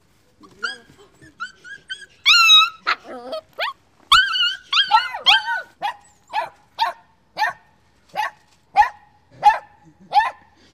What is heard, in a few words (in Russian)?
zvuk igrivyh schenkov vo dvore; malenkih schenkov